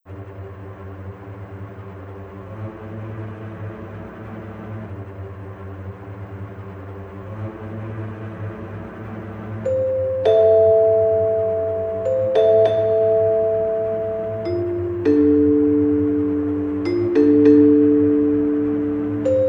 dungeon; iragula

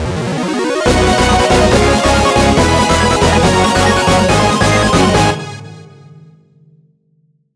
boss defeat

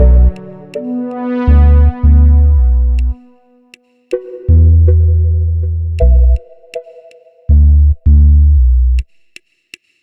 stem outro; Pix the Cat

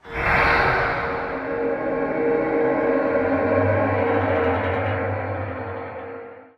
Stab

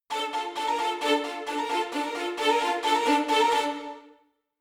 Combat In; The Witcher 3 Wild Hunt; Skellige

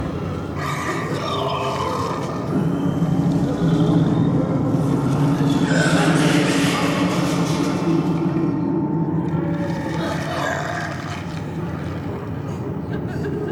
Ambient; dante s inferno; x360

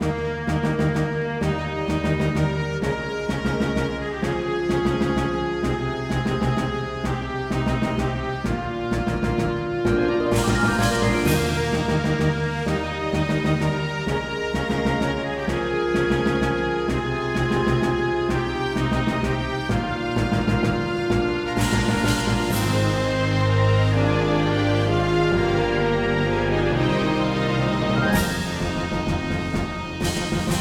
Marching Song; Vester; Tearring Saga Series: Berwick Saga; PS2

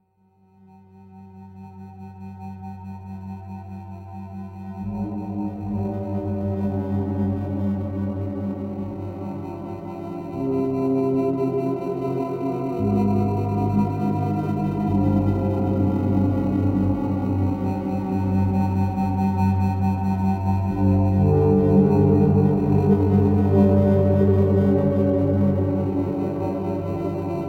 Outdoor Secondary Music; Escape from Tethys

